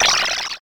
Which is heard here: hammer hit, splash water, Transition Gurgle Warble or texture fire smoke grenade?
splash water